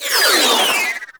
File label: Sentient Marrowtick Pod Incoming; Warframe; Tau